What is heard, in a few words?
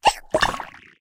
Pet Possum Transform Small